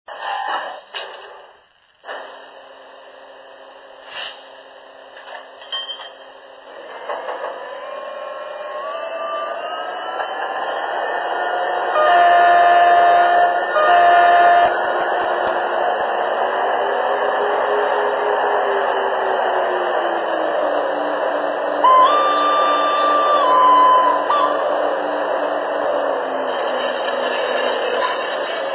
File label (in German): Zahnrad